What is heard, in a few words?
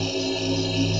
Poison Dagger